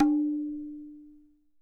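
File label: TABLA right; WorldSounds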